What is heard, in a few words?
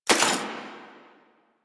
Mech Alt, Rifle; Weapons; Warframe; Thanotech; Trumna Prime